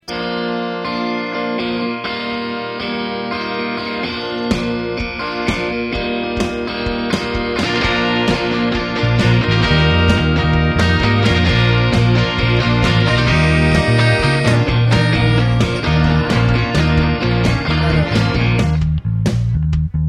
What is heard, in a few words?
Strings